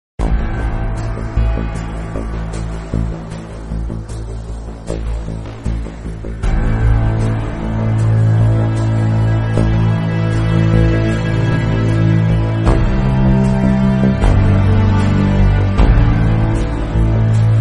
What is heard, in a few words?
music; cs 1.6